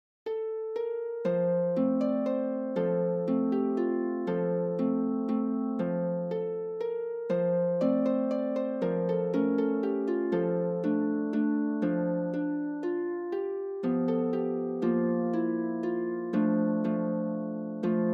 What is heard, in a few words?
harp